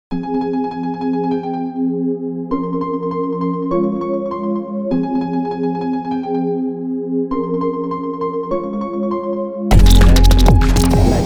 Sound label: glitch beats idm; weeklybeats 2024